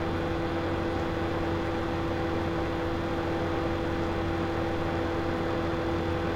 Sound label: laptop; Original TK Sounds